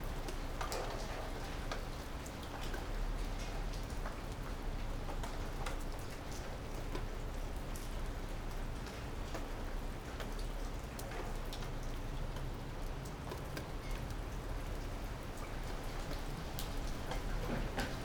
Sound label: Rain urban gentle; MS STEREO; Ivo Vicic; Rain in urban and natural environment